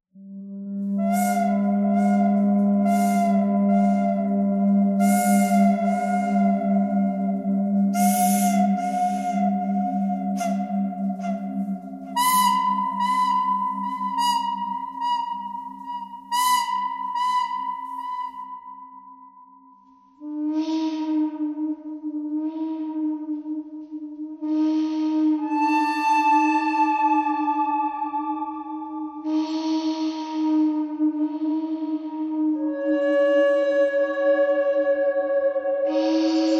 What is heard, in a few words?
bird canyon bird thread noise; birds